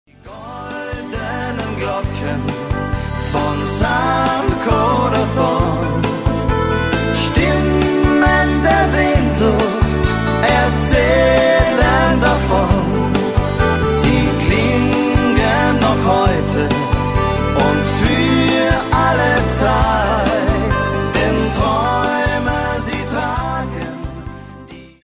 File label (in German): die goldenen Glocken